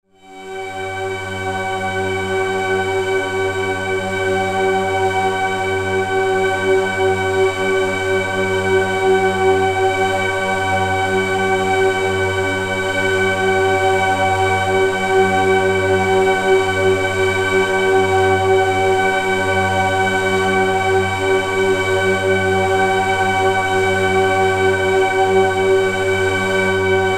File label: crazed organ